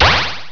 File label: marker; Chase Hollywood Stunt Driver; XBOX